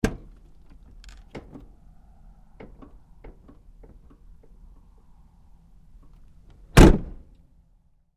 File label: Truck GMC Savana Door Open-Close Hard; Trucks; Vehicles; 2010 GMC Savana,Door,Open-Close,Hard